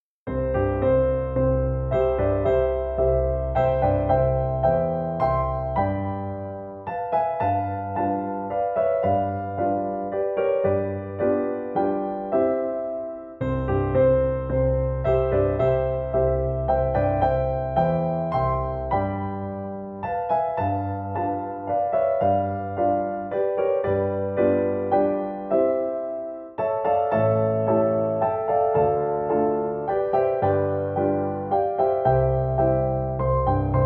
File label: Waltzes No 1 In C Major; 12 Waltzes; Franz Schubert (PD)